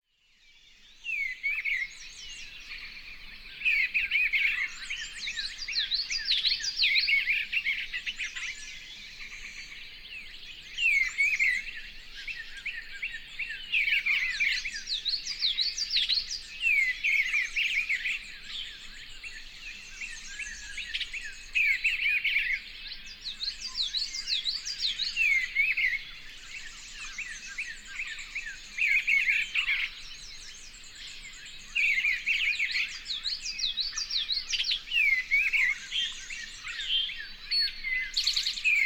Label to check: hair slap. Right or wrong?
Wrong